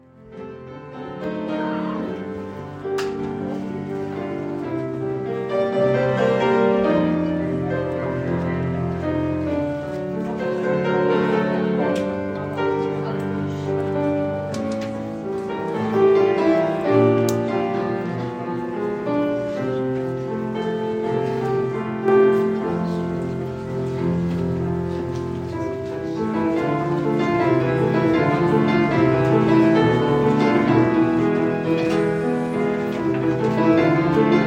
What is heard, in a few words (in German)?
klavier; eine theaterromanze